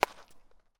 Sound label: sport baseball hit; martinimeniscus